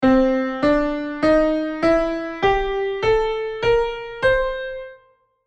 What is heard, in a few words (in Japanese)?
Jazz ブルーノート; Jazz講座用DEMO